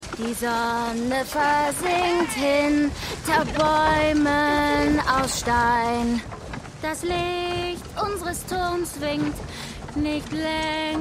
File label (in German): Magsame Gesang; DER HERR DER RINGE: DIE RINGE DER MACHT; The Lord of the Rings